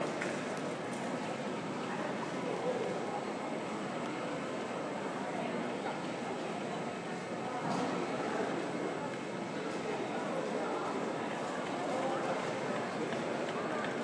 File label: manchester airport terminal 2 ambience